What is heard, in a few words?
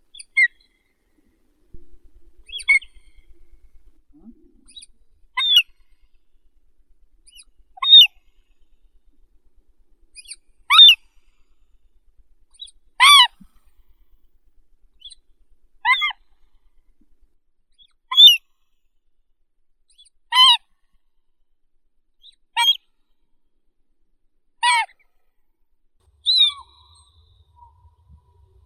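whiskered auklet, Aethia pygmaea; konuga malaya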